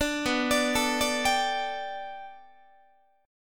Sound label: B-Minor 7th Sharp 5th B down Guitar; Bm7#5; arpeggiated; JGuitar